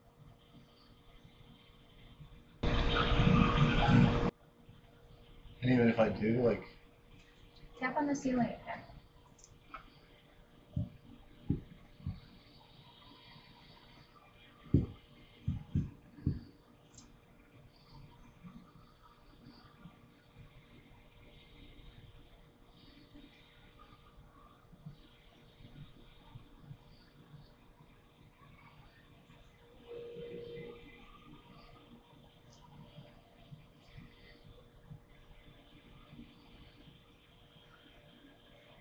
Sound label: Basement Open Space EVP Enhanced; caliente pizza draft house crafton investigation